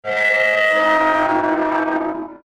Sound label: destructive metal scrapes